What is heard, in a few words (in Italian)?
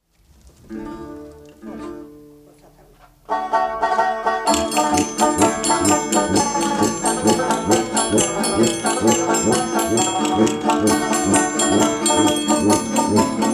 tarantella napoletana; baiardo